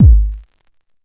rich lows kick drum g sharp key

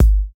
classic studio steel kick drum sample G sharp key

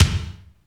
high quality pop bass drum sample f key

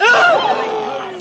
fear gasping

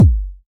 steel kick drum G key

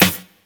snare sample F sharp key

